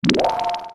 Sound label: sellection button scfi; Sound-Graph